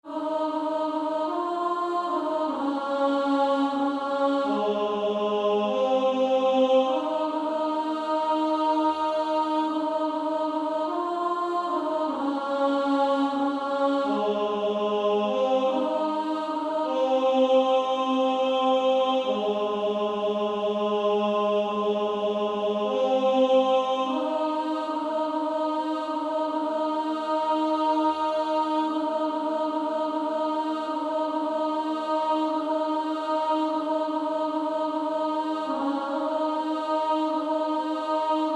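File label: Female Choir, alto 2; Only a step to Jesus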